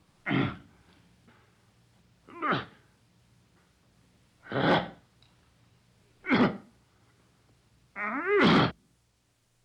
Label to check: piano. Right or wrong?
Wrong